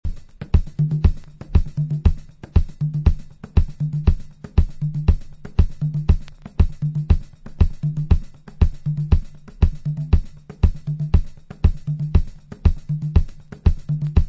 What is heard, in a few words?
drums